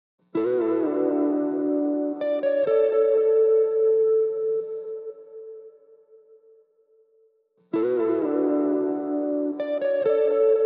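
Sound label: 130 BPM D Major Accent, Guitar; Melodies; Loop Stems; Cymatics; GHOST (Beta Pack)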